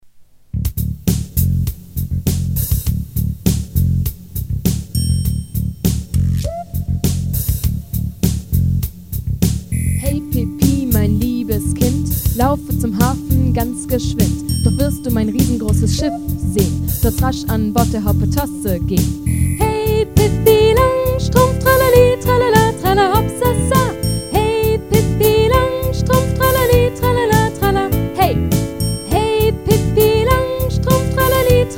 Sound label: Rap; Pippi